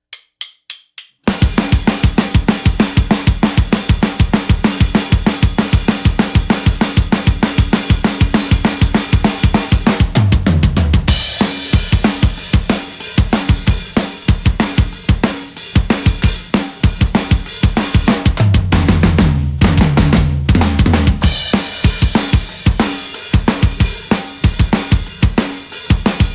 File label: studio drums